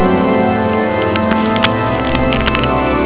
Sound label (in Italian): organo; colon